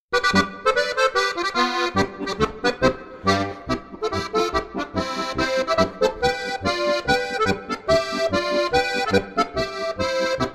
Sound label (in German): polka